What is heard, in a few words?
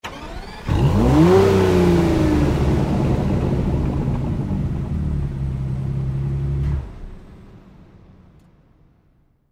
Aston Martin DB11 Engine Start Immediate Rev Stop